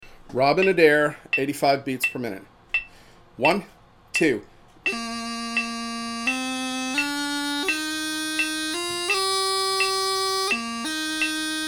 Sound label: Robin Adair, bagpiper, 85bpm